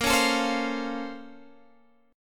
Bb Minor Major 9th Guitar Open D; x,13,11,15,15,15

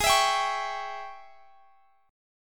Ab Major 7th Suspended 4th Sharp 5th Ab

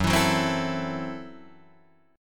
F sharp Minor 7th Flat 5th F sharp Guitar Drop D

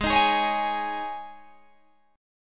Ab Suspended 4th chord, Guitar, Open G tuning; Absus4; strummed; chordshape; 18,20,18,18,21,18

